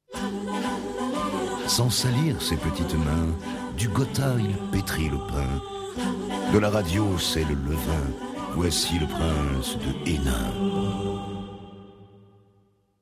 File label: jingle; supernana